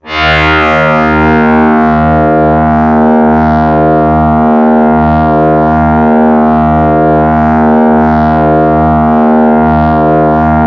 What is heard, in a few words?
DoubleBass d#2, Yamaha YX81Z